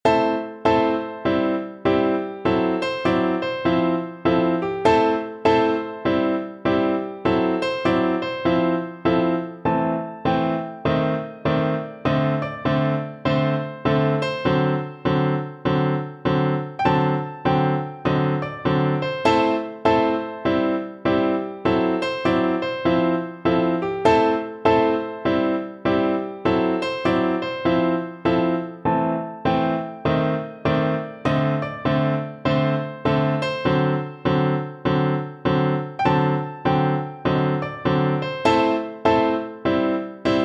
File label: piano, piano duet